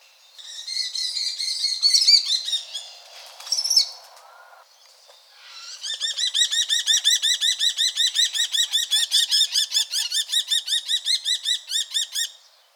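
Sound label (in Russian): obyknovennaya pustelga golos, common kestrel voice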